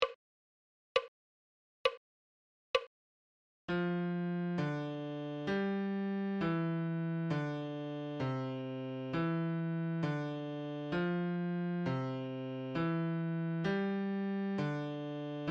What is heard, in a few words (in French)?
Clé de Fa, Adagio